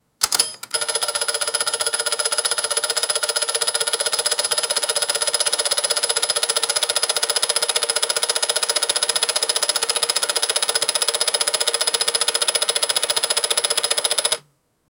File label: tabata timer